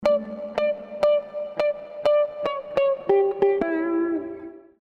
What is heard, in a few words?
Fretless Guitar